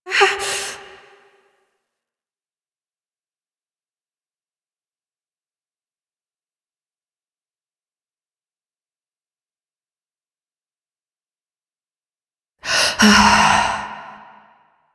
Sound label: Kiss Breathing; Cinematics; Warframe; The New War; Throne Room